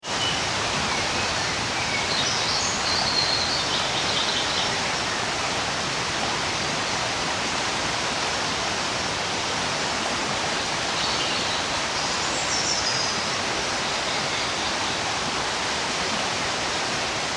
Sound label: Tarr Steps Walk Exmoor National Park; Cesar Gimeno Lavin; A lovely circular walk along the river where the ancient clapper bridge